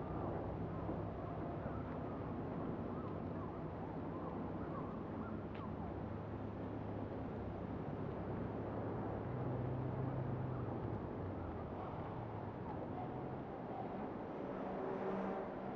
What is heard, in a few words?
amb int small room; Titanfall 2